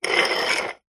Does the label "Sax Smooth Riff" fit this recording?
No